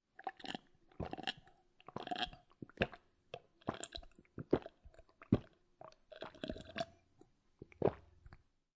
Human, Drinking Glass Of Water, Slurping, Swallowing, Drinking Entire Glass